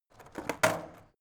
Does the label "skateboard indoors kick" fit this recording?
Yes